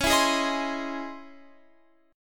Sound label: C sharp Minor Major 9th C sharp, chord